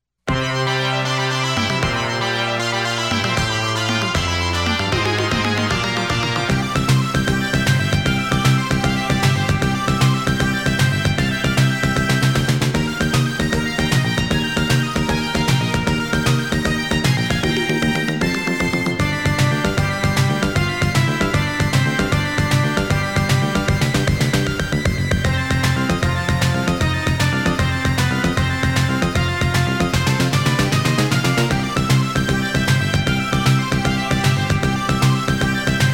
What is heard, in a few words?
Fly High